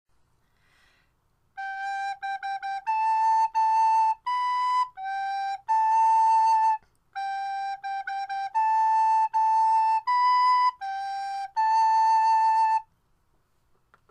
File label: theme from nutcracker march